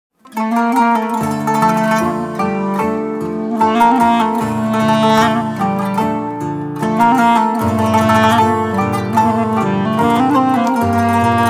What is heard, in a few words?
instrumental